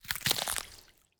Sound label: environment heart damage gore